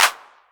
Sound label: Clap, Novation Drumstation; DrumstationV1